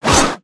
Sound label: Swing; Little Friend